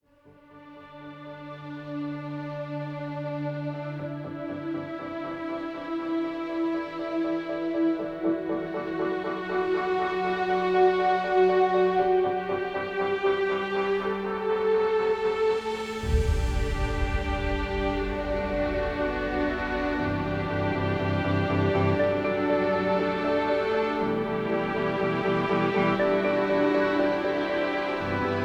Bliss Ambient

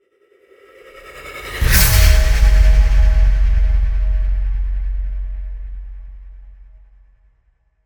Impacts; Gothic Storm Music; Advanced Sound Design

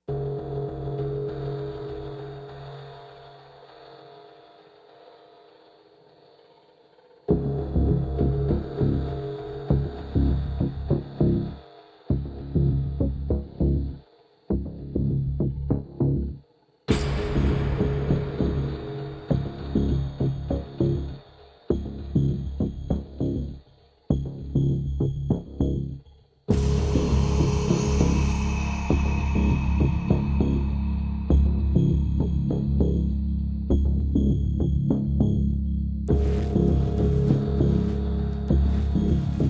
Mounting Synths And Perc; Ninja Tracks

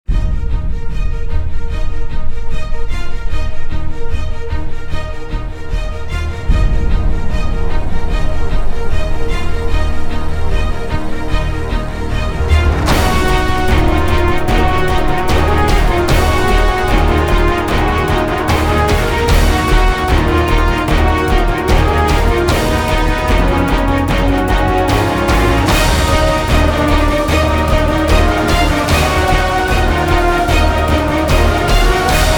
No Vocals, Epic Action & Adventure

